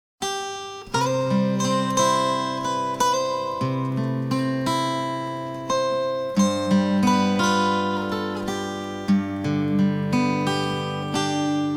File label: Guitar version; quartett the stage of love ost